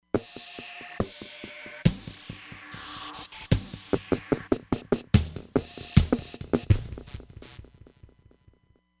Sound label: Low Freq Hit, Roland Fantom XR